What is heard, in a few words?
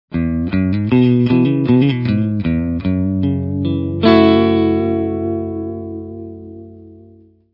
phaser; vibrato